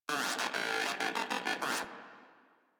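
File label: Moa Aggressive Vocal Upgrade Bored; Warframe; Pets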